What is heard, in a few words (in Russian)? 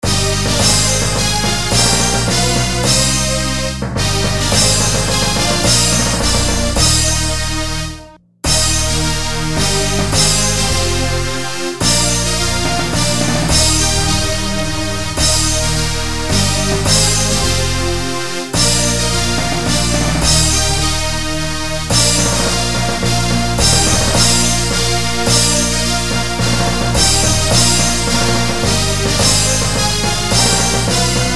Гимн лицея (музыка)